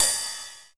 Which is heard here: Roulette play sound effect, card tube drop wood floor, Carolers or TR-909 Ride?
TR-909 Ride